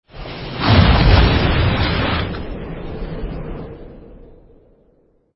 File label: Cast Frost; endless world idle rpg gamerip 2019